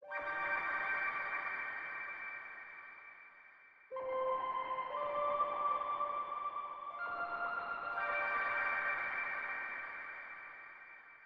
123 BPM G Min Texture, loop stems; Melody; God Fearing

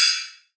Ride, cymbals; Rides; Future Bass; Drums; One Shots

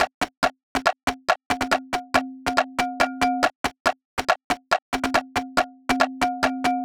Organic Percussion Loop - 140 BPM; Dubstep; Drums; Loops - Top

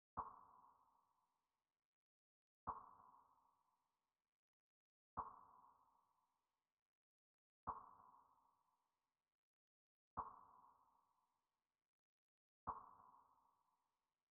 96 BPM F# Min Percussion; Melody; RnB; Loop Stems; Cymatics; 2022 Melody Collection - RnB Pt 1; Break Away

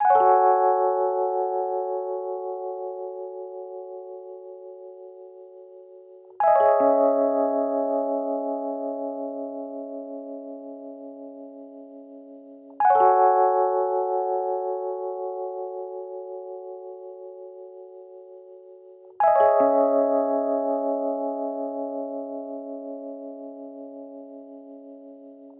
150 BPM C# Min Keys; Cymatics; Code Of Honor

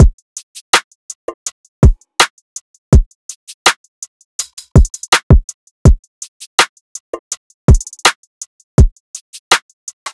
Drum Loop 82 BPM; Distance; Cymatics; Vibes II Drum Loop Collection

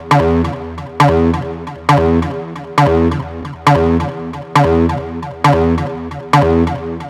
Synth Loop E 135, Techno

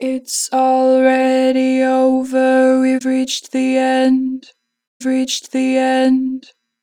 Harmor Vocals; 4 bar flat; Pitch Flat

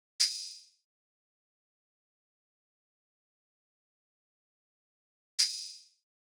Drum Loop 185 BPM Open Hihat; Drum Loops; Loop Stems; Cymatics; Mystery Pack Vol 4; Stomp It Drum Loop

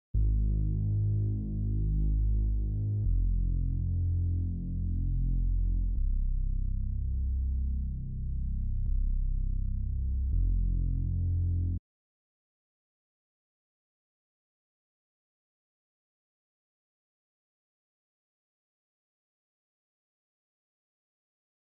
165 BPM C Min Bass; Reverse; Stems; Cymatics; 2022 Melody Collection (BETA); Fear And Greed

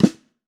Birch Trap Flam, Snares; Drums; Ghosthack - Free Snares 2023

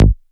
Ashes Bass A

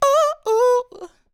Ad Lib Dry, 100 BPM D Maj, Vocal; Cymatics; Euphoria Vocal Sample Pack; Ad Libs

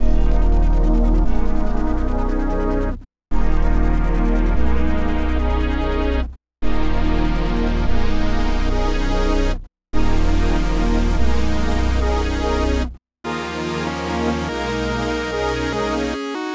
145 BPM D# Min; WTF; Wavy

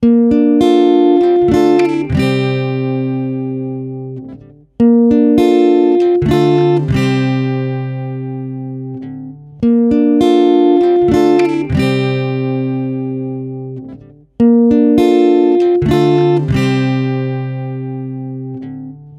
Guitar 100 BPM (A#maj); Abandon; Ghosthack; Guitar Loops & Shots

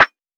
Lofi Snare

